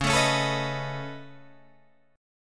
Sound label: Db 7th Suspended 2nd Sharp 5th Db down Guitar Drop D